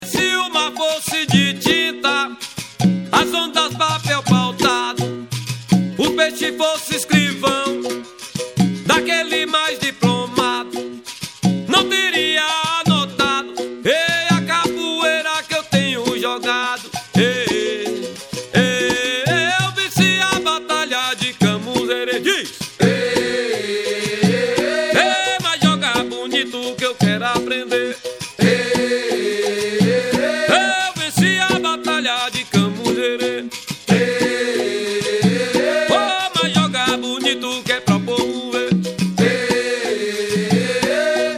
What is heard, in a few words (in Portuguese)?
Capoeira Musicas; Esportes; peixa escrivao